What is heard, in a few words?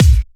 Hard Kick; Vengeance Samples